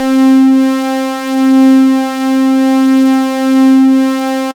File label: Synth, Raw Analog Multi's; Dream Zone